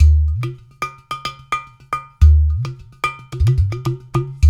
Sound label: UDU, Udu Bata; Spectrasonics; Supreme Beats